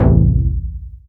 JUNO C3, BASS; Q Up Arts; Dream Experience; BASS BANK 1

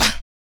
KUK, MALE PERC

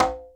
Conga, Percussion, Yamaha DTXpress

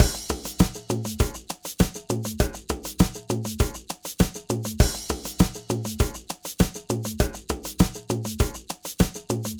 Ensemble, Latin Percussion

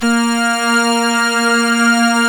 ORGAN, A#; Big Fish Audio; Synclav FM

